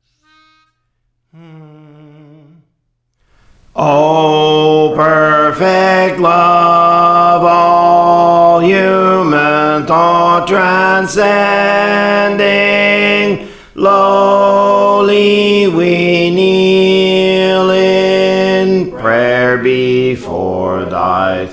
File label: Bass, Songs; Praise For The Lord Training